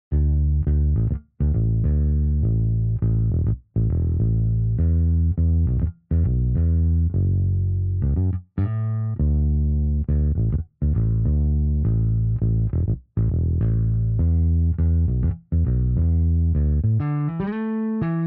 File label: bass before